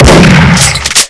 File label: Shot